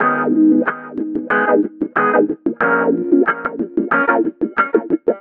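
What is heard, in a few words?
guitar, Roots Funk; USB Soundscan vol.41; AKAI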